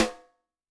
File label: Snare, Snare; Yamaha DTXpress-II